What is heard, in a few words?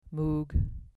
moog